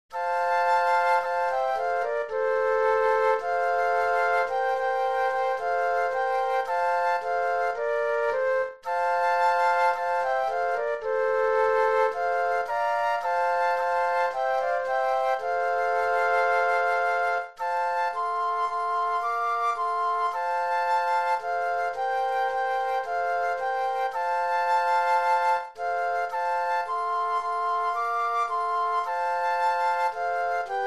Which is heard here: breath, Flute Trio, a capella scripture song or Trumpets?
Flute Trio